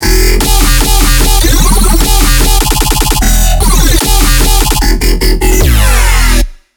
E 150 Scraper, Dubstep Bass Loops

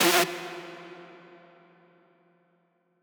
synth screech oneshot F; Synth Hits; GhostHack

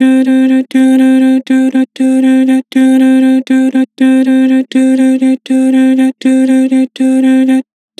"Doo", Vocals; Harmor

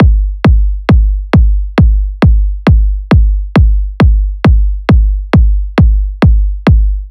Kick Loop 135, Techno Drums

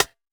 Percussion, Hip Hop; Drums - One Shots; Cymatics - 9God Hip Hop Sample Pack

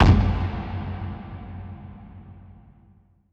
Cinematic Impact (Crash); FX; Cymatics; GHOST (Beta Pack)

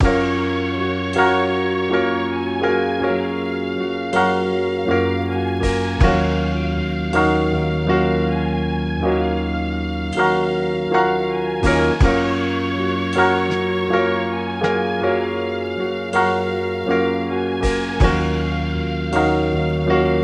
80 BPM C# Maj, Melodies; Cymatics; ZODIAC Beta Pack; Culture

